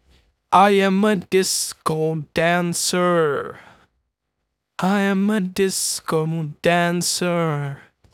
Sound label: recording vocals; musicfy